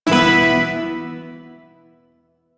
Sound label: Shawzin Chromatic Chord; Warframe; Duviri Shawzin